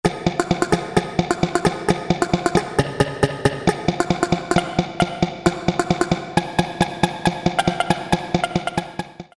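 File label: Sequence, Yamaha TG33; Factory Presets; Preset bank 1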